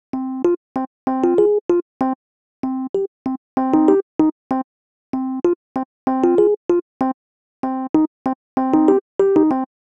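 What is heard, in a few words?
Hip Hop E-Piano, Fmin, 096 bpm; Pro Tools Essential Loops; Digidesign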